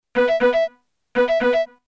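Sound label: low battery, phone tones; LG GD570 dLite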